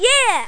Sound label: yeah; Matmath